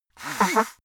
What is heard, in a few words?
nose blow dry; koerpergeraeusche; menschen; soundpool